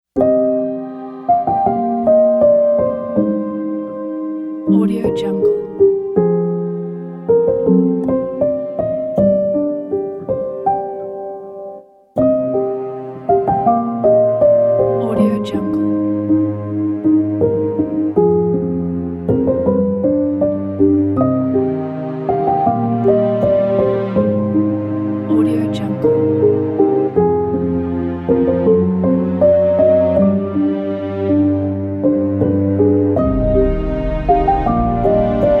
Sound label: emotional piano